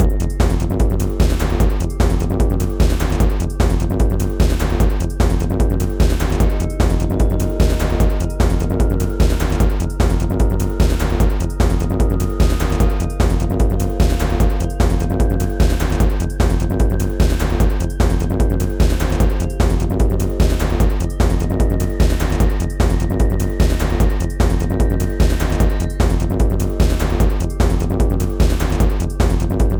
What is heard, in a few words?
industrial bed